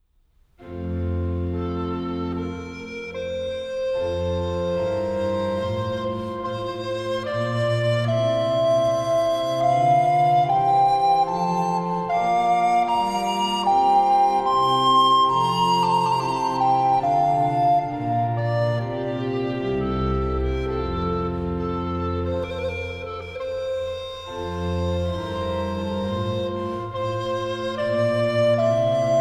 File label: Larghetto